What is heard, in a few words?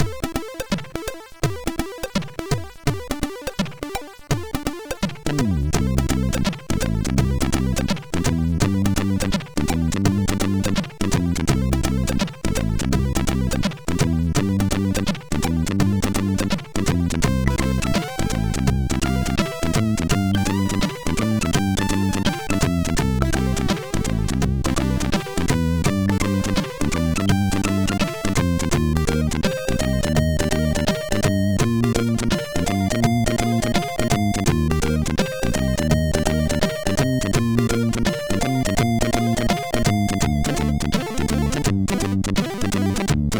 Jazzy, MOS6581R4; Jazzy-d